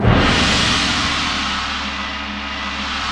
PRC GRONG, Grongkas

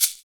Shaker; Equipped Music; Loungin House

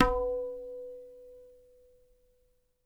SMALL B, NYC Percussionworks; Sampleheads; 90 s Sample CDs

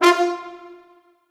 Orchestral Brass

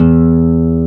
G E1, NYLON G; AKAI S-Series CD-ROM Sound Library VOL-1

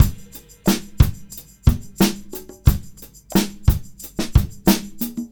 Combo, R&B Drums

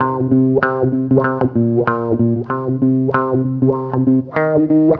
WAH, wah wah gtr

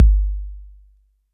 Roland 808 BD; Drums; Tr-808

